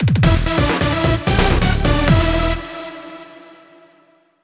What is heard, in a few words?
Jingle Loose; Kinnikuman Goku Tag Ranbu